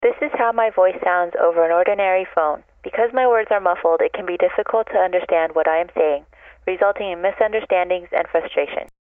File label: Ordinary Phone